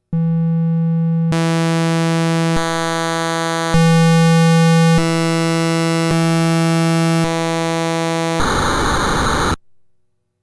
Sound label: waveforms 8580, midibox sid; the different waveforms are played from a 8580; midibox sid v2